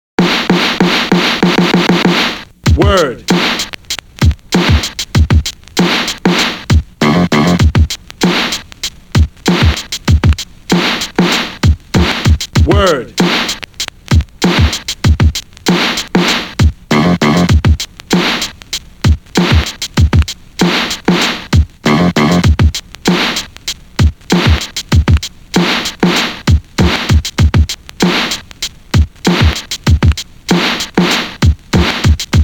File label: breaks; Super Duck Breaks